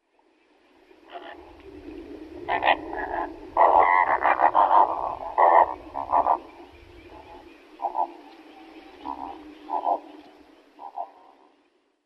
Phoenicopterus roseus; aves

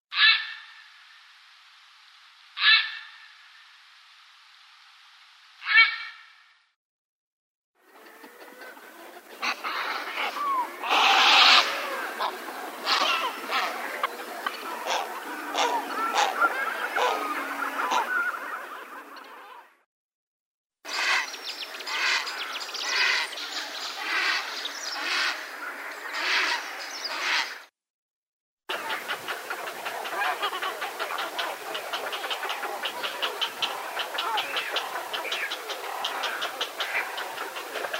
Ardea cinerea; aves

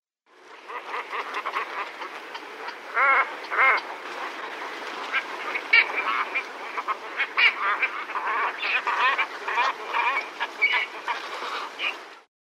Phalacrocorax pygmaeus; Голос; aves

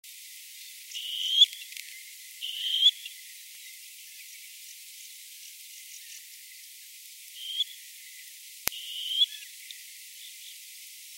Podiceps nigricollis; aves

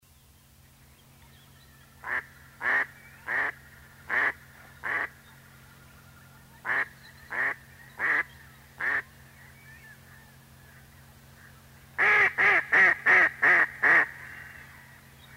Anas platyrhynchos; Голос; aves

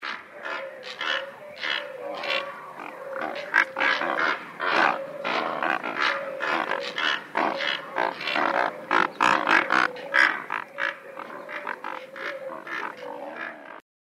Pelecanus onocrotalus; Голос; aves

